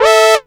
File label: Synths; Vengeance Samples